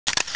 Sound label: cam snap